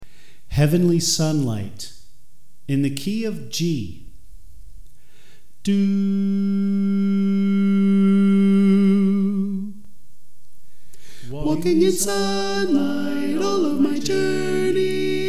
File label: Alto